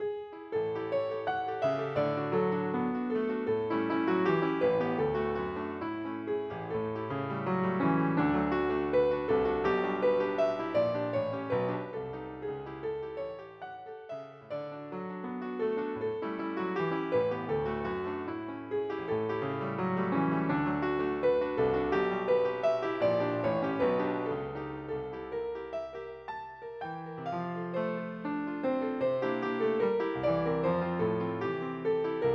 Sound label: MELODY